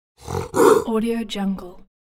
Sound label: wildcat hiss